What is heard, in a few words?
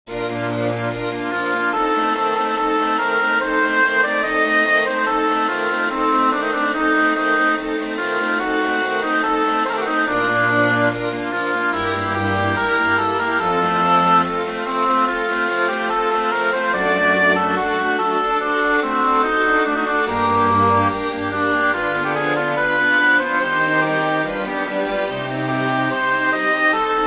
recitative for oboe strings; bach johann sebastian die schlange paradies